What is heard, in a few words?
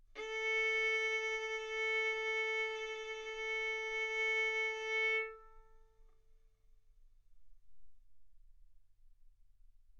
Violin arco A4 B4